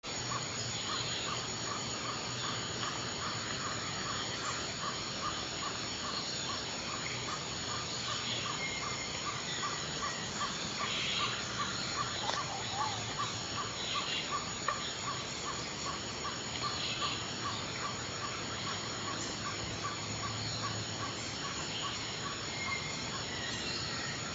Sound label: rain forest sounds